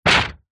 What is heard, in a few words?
impacts metal crunchy hit sweetener